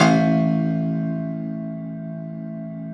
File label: Rubbery Piano, prepared piano; Total Piano